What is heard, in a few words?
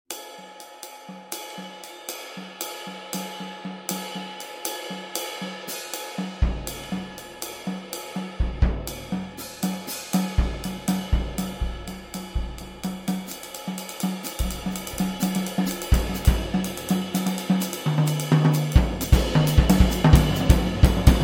Drums Solo